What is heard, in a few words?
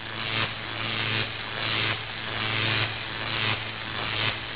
celectric